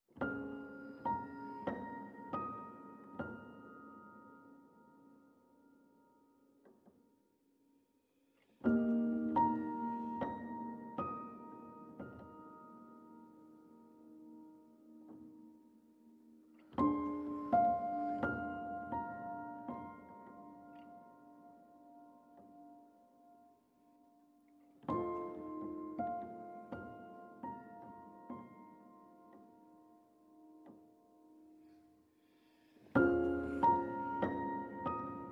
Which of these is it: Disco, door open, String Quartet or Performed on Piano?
Performed on Piano